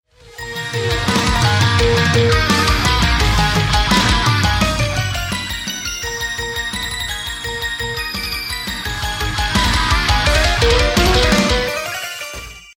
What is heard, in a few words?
synth; shokran synths pack